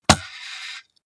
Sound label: computer mouse draw drop hard